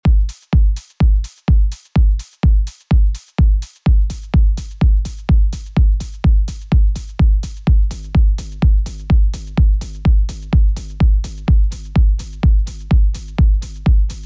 Techno Kick Rumble

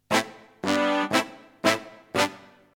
brass short hall

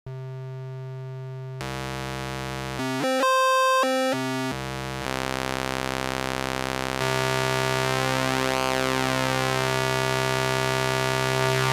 Oscillator Heaven

